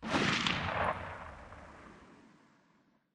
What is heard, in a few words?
hilo tail; Titanfall 2